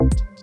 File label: Synth Bass; BB 2HTC Samples Vol 4; Weird